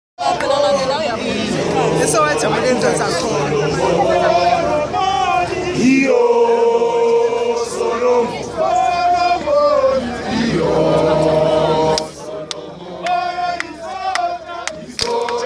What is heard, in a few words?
singing outside Mandela's home in Houghton